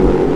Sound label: bull roarer; E-MU Formula 4000 Series Vol. 5; Protozoa; Proteus3 Presets